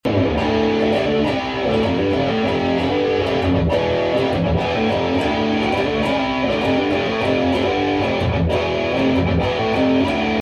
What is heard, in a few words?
Riff, gitarrist, rock metal; thun; schweiz; gitarrist sucht rock metal band